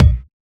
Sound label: kick drum single shot d sharp key; kick drum samples